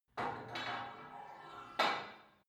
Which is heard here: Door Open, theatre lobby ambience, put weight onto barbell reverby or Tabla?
put weight onto barbell reverby